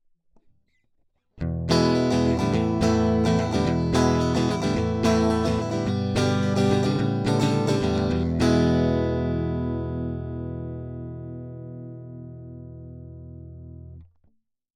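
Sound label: E Gtr Direct clean, electric guitar; Warm Audio TB12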